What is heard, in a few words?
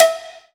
COWBELL C; Cuba; AKAI S6000 CD-ROM - Volume 5